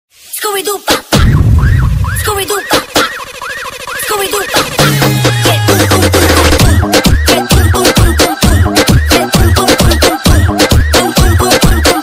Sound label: english ringtones bgm ringtone